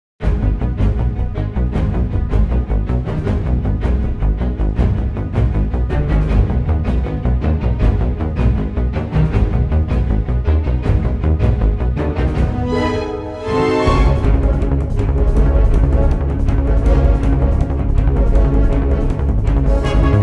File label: Epic Futuristic Car Chase